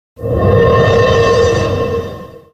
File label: Sequence Completed; Serious Sam The First Encounter 2001; game soundtrack; Cut Scenes